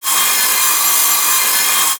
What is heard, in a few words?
saw loud; niosh; buy quiet